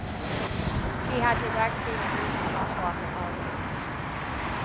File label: mix Street